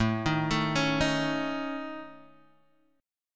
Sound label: A Major Flat 5th A Guitar Open D, chord